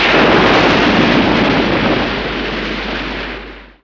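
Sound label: water object explosion; PC Gamer IT CD 47; USAF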